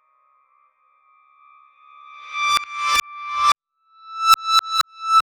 scary reversed music box

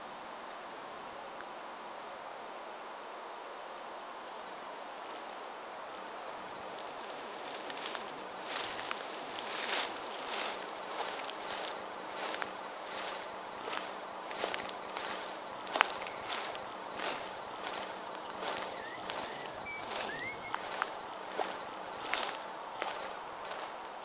natural ambient sounds of, afternoon

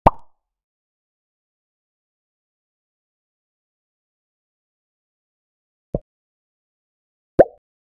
short cartoon impact soun; sound effect

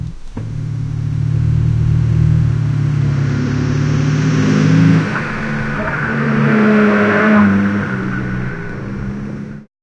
detailed recording of a s, cars

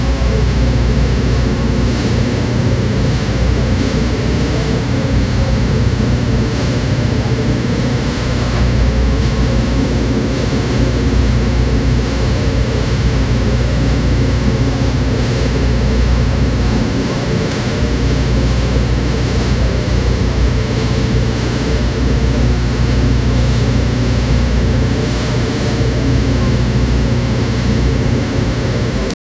the laundromat by the sea, machines

